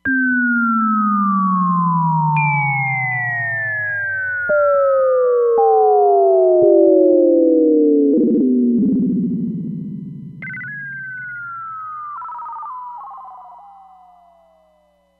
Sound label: Reso Sweep Dn